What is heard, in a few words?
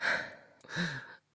Downed Breathe; Enemy; Lady Deora; Battle Barks